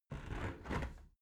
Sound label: window close soft